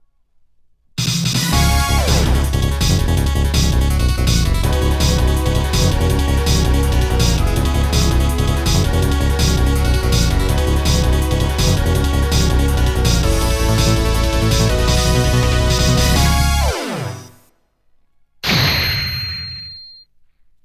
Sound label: Title Coin; viper phase1